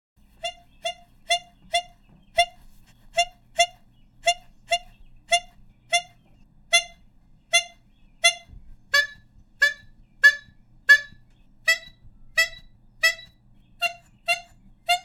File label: Toy Squeak